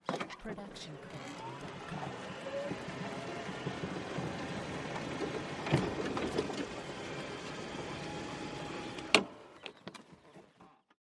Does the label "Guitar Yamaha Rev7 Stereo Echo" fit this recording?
No